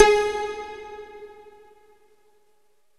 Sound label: LINEAR SYNTH